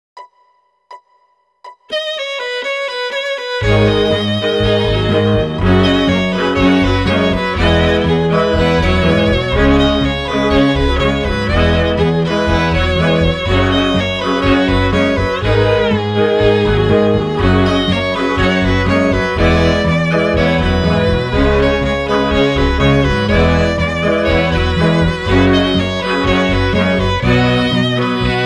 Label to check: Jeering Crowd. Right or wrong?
Wrong